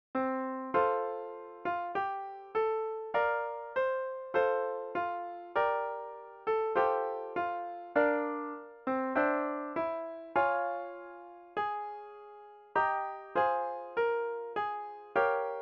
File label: hymn melody